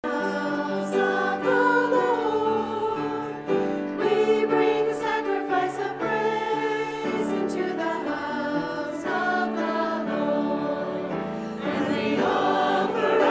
gospel sing choir

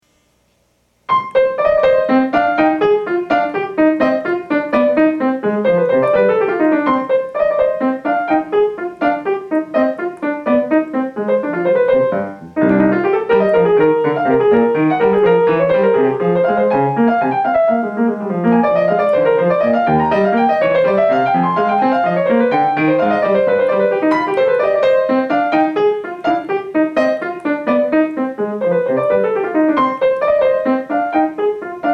Rondo; Partita No. 2 in C minor, BWV 826

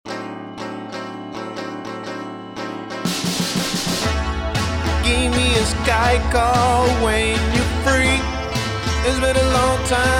INDIE

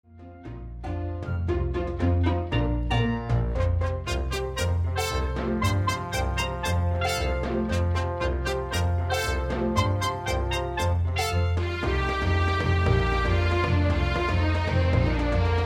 Conga

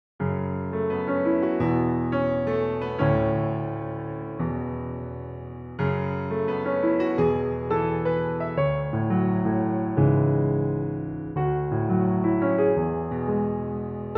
Main Theme (piano)

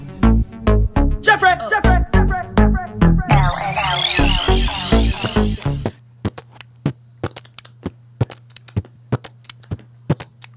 Beetbox; Radio-Fredikaluit

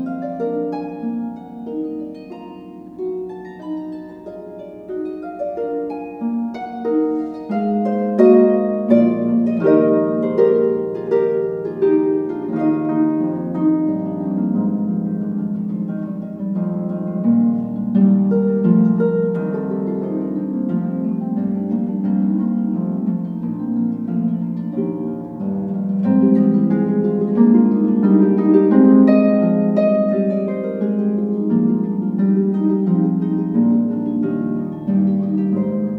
Prelude in E-flat major; "St. Anne"

